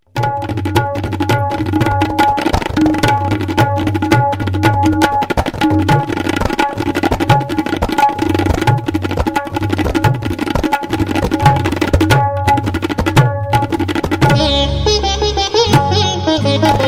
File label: CLASSICAL TABLA